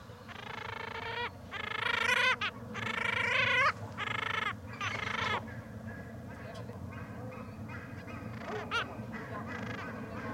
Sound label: tyvjo stercorarius parasiticus